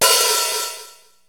hh open, rx5; HI HAT

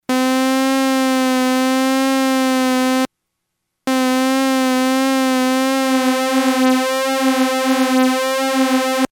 lfo osc; nord3

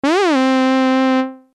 lfo env; SHIFT+押下でEnvを点灯させるとエンベロープモードとなってWaveformが１サイクルで終了してしまう。; ありきたりなところでは、決まった波形ではあるけどシンセブラスなんかのアタックに使えそうだな。; nord3

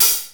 half open hi hat; hr16

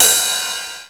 ride, tr909

